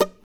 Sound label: NOISE, guitar; Spectrasonics; Hans Zimmer Guitars VOL-2